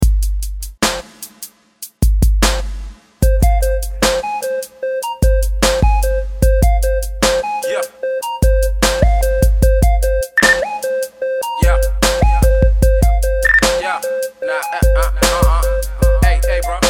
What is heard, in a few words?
Nextel Chirp Remix; Nextel Chirp 2011